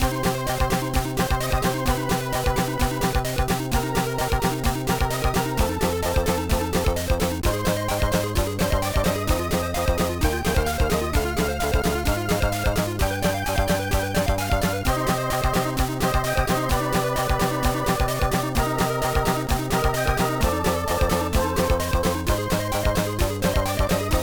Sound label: BGM; Wanko de Kururin Wancle; GBA